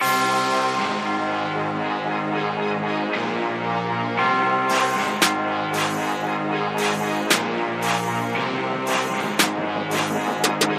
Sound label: Slow Motion Explosion